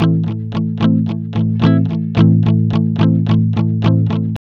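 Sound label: ROCK G sharp minor 110, Vintage Blues Guitar; USB Soundscan vol.22; AKAI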